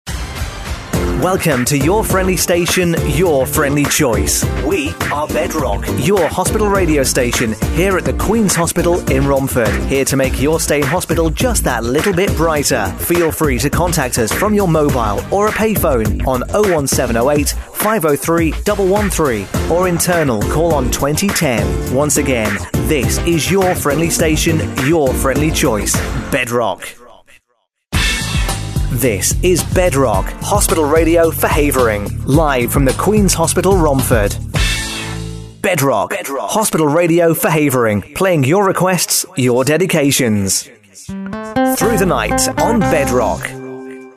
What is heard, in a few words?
JINGLES; BEDROCK